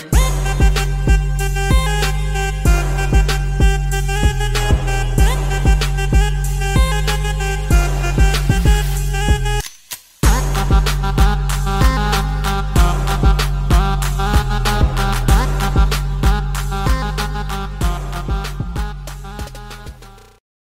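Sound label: english background music ringtone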